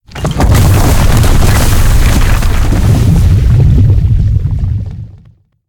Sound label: falling stalactites; Level4 Hidden World